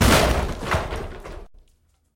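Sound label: short quiet experimental explosion sound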